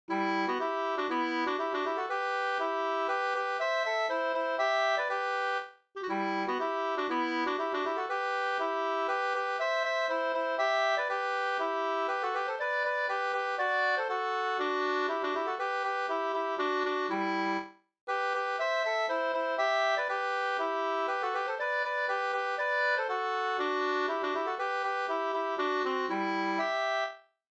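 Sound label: polska, two-part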